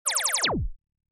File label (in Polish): Laser, dzwonki na telefon